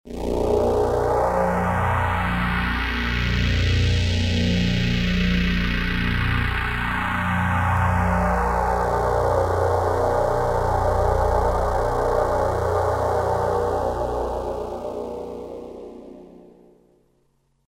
Phased Out; Cobalt8

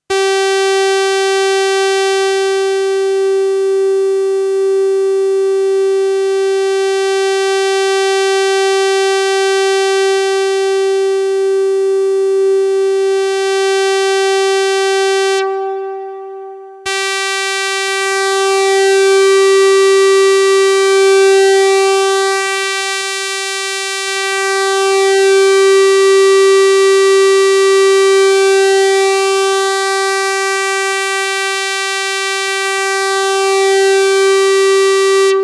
OB-1 Waveform

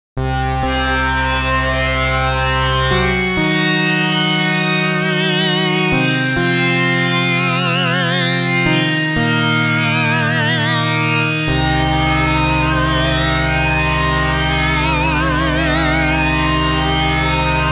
Osc Delay Repitch

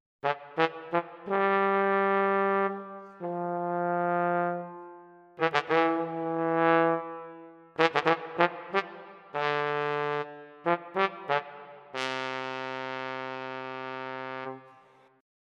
Sackbut

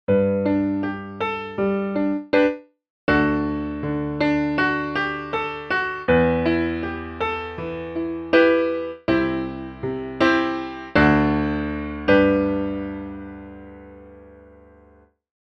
Grand Piano

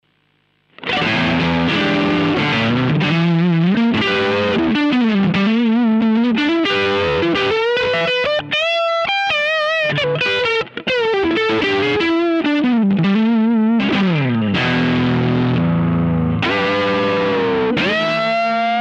Clean Channel Cranked up; OTS DI

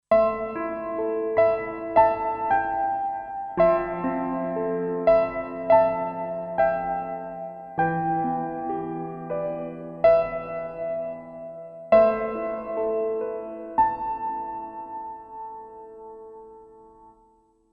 White Piano Intimate; NADA Instruments

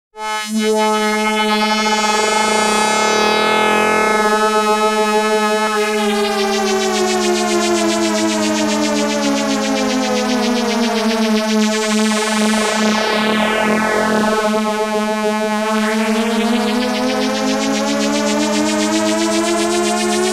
Sequential Pro 2 Alternate Tuning

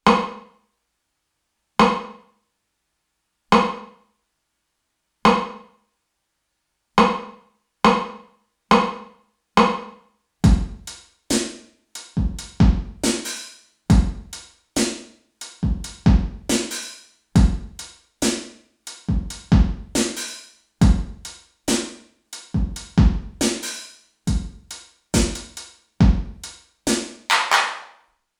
Sony DRE 2000 TR909 Sony MU R201 Room

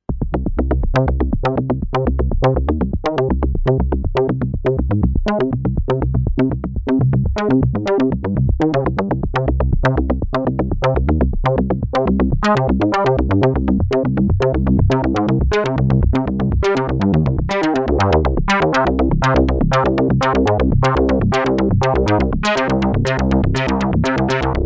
Monopoly Industrial Arp

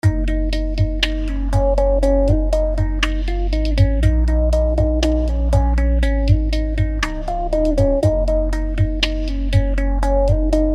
Panning Sweeper; FilterShaper 3

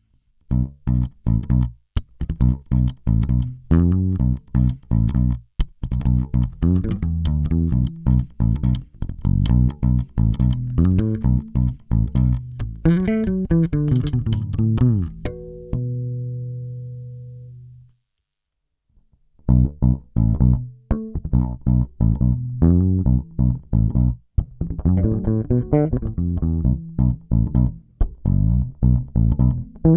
Fretless Both Pickups; Rheingold

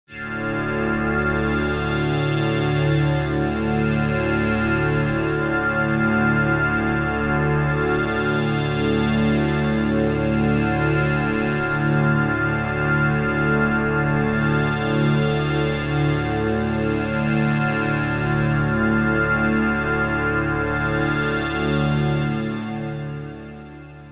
Wavetable PWM

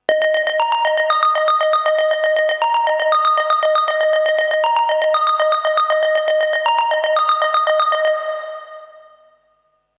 MDX2600 Arp

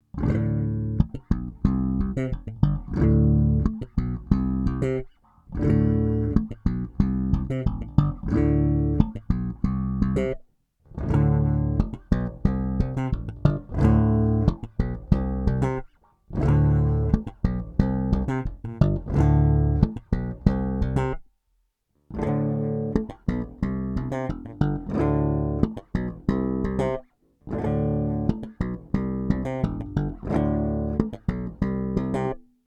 slap

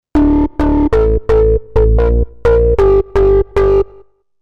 Bassline Bright Attack Drive

